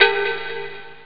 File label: cowbell, drums; OctaMED SoundStudio V1